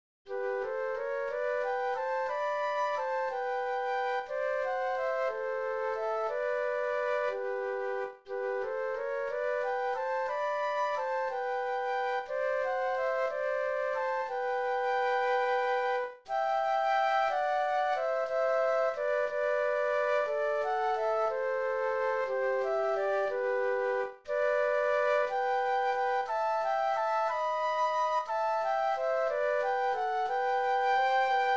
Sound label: Flute Duet